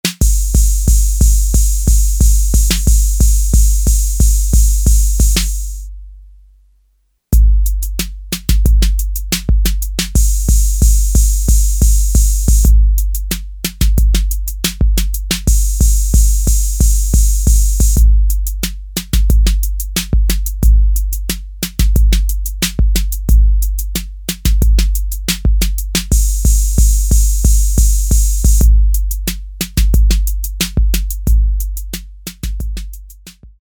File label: Fill in, Roland TR-808; Factory